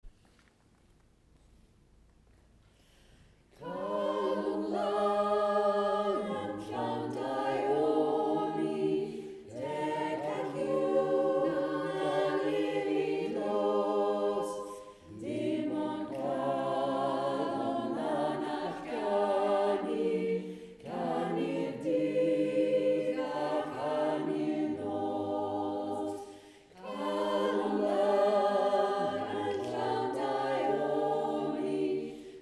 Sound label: Choir Calon Lan